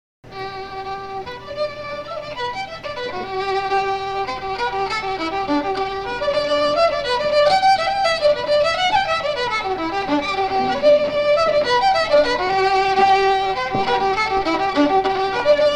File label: fiddle